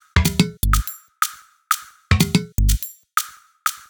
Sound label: Top G# 123bpm, House Drumloops; Zero-G House Fabrik Drumloops